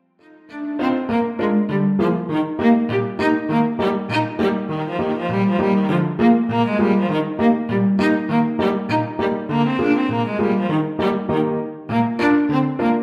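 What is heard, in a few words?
reel viola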